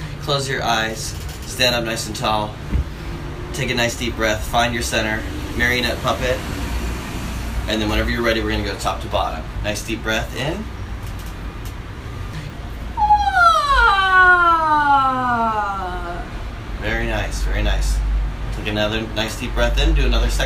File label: Vocal Yawns, low vocal exercises; The better the breath - The better the sound